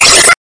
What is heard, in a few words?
smack